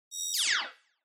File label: TV Turn Off Wet